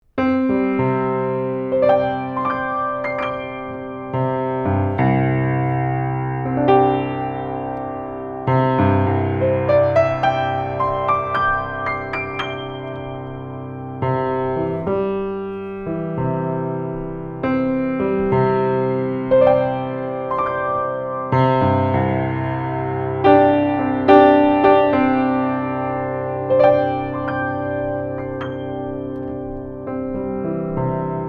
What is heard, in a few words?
Piano